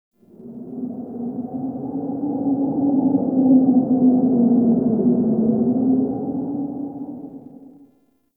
Forest Cave Howl; Ambience; Warframe; Grineer

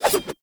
Idle Swing Double, Weapons; Warframe; Tenno; Revenant; Nikana

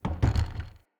Wood Stool Impact Light

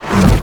Overhead Smash Attack Pre Hit; Enemies; Warframe; Grineer Nightwatch

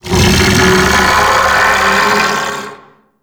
Chain Reaction Beam Vocal; Warframe; Qorvex

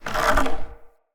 Wood Stool Drag Scrape

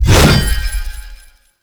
Sword Swipe; Warframe; Ballas Sword; Weapons Melee; Whooshes

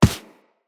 Run Default, Footsteps; Characters; Operator

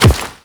Step Rock, Footsteps; Characters; Warframe; The New War; Kahl

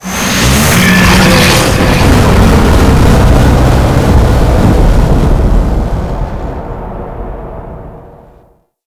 Roar Power; Warframe

